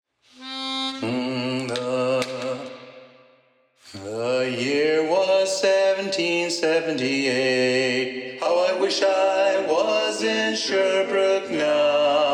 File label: Lead Predominant; sea shanties